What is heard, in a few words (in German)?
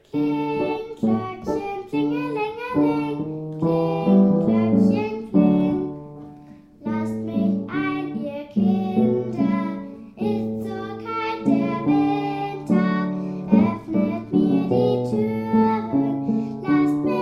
Kling Gloeckchen mit Gesang